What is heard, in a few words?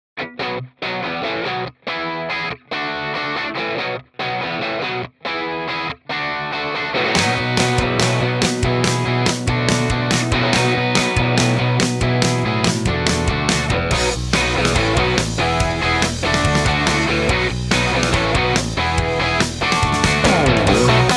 Sidewinder - song; mojave